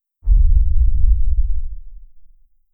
immediate silence after, dramatic

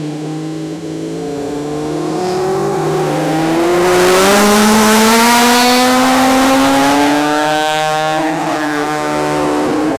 a formula one race car

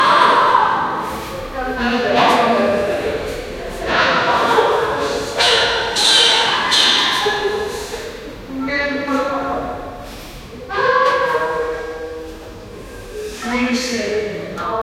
hospital noise, activity

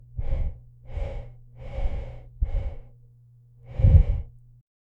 human sounds

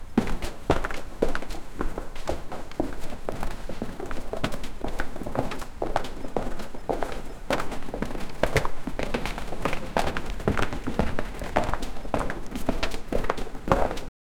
scattered footsteps on th; travel

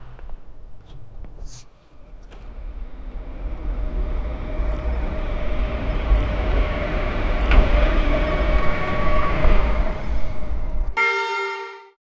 transporting sound effects, accelerating

